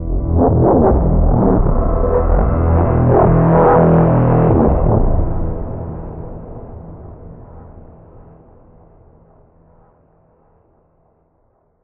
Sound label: vault warp; Half Life Alyx; music